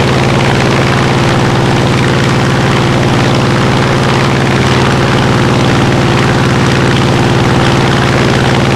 hellcat rear, engines; War Thunder

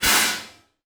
Aircrafts Pneumatic Brakes Off In; DCS

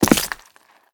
foot glass stone, footsteps

